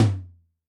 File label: Dry Lo, Tom, Yamaha DTXpress-II